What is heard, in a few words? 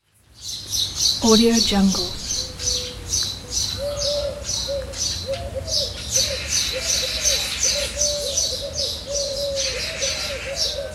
birds in the village